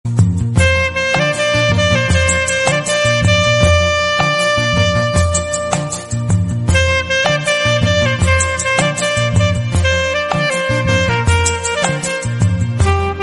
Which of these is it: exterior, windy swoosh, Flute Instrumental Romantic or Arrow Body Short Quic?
Flute Instrumental Romantic